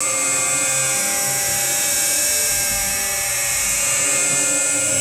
intense multi tonal electrical drone buzz

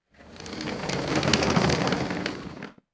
rolling suitcase passing left to right; foley

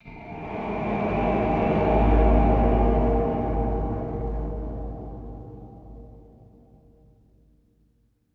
cinematic metallic swell with long reverb

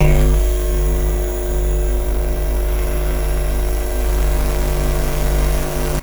pulsating electromagnetic sci fi hum

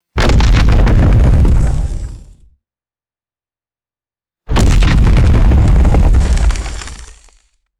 heavy booming wood impacts and rumble

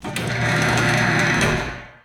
fast metal garage door opening; doors

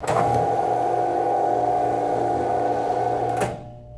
motorized projector screen rolling up; motors

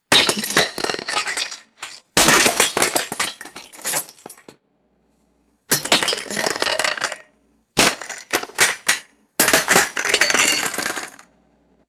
handfuls of junk dropping scattering on concrete; foley

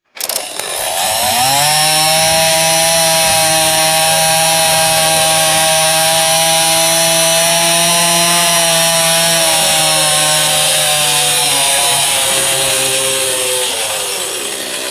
electric chainsaw running and revving; machines

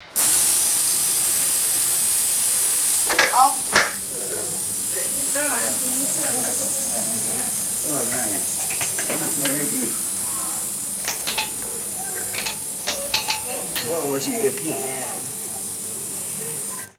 loud water spray and dishes clanking; ambience

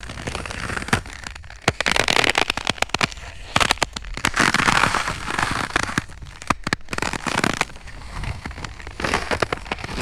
low pitched ice crackling and crunching

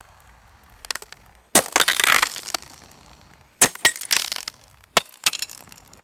metal ice pick striking hard ice; tools

